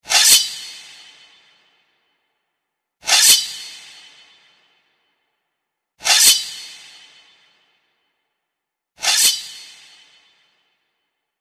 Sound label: Level start; The Cursed Crusade; Xbox 360